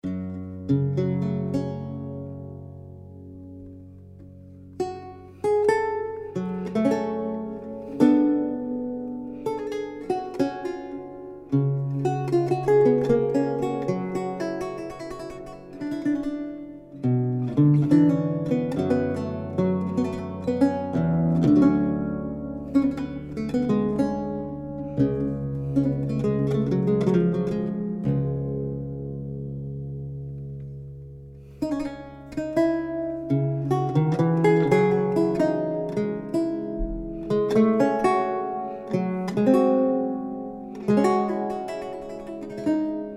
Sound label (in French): Prelude